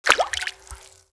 water; Mafie 1

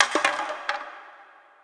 can; Mafie 1

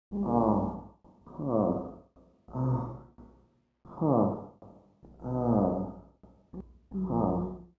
sex; Mafie 1

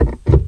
gear; Mafie 1

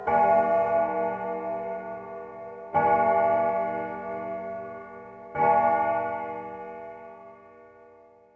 clock; Mafie 1

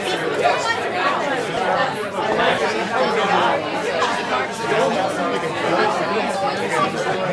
pub; Mafie 1

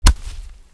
grass; Mafie 1

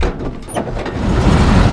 truck on; Mafie 1

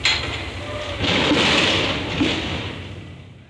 bum; Mafie 1

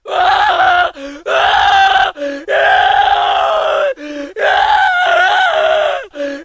burn man; Mafie 1

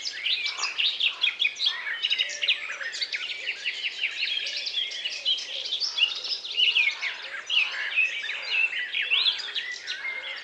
out forest; Mafie 1

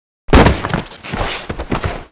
body; Mafie 1

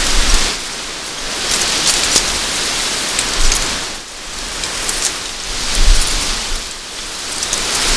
tree; Mafie 1

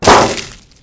bush; Mafie 1

